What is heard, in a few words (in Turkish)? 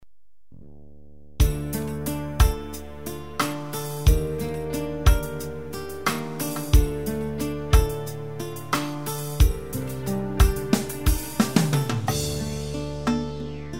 slow, ketron